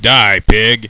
DIE P; DOOM

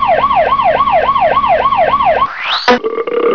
SQUASH

